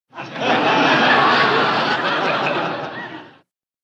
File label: Crowd Light Laughter, medium